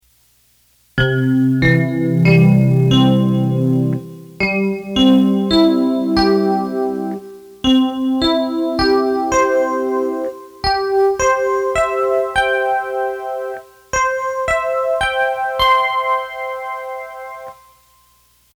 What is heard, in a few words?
DX7; ketron